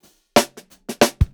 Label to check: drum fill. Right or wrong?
Right